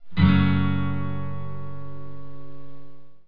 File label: f# m chord, guitar